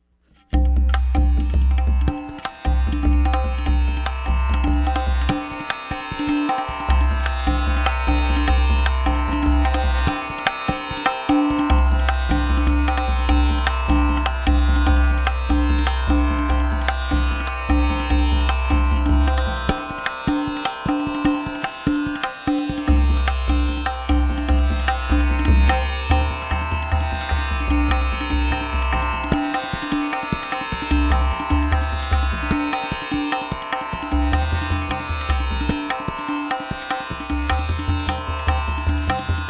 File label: Tabla